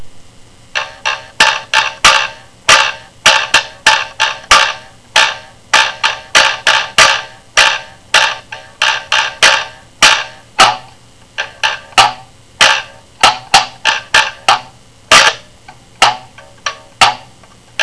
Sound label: flute fugue mbira